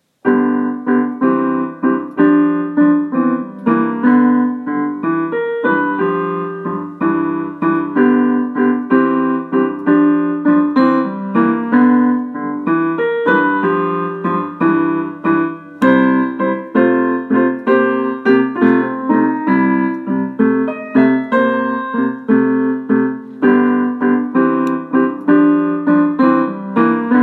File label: blues